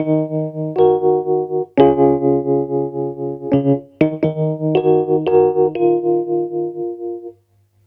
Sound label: RHOD L, Roots Disco; USB Soundscan vol.32; AKAI